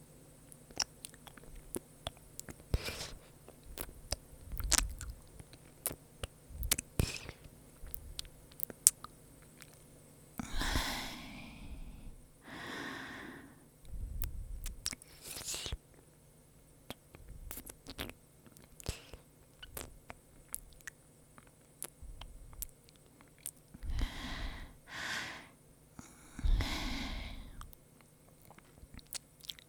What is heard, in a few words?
3D ASMR; wear headphones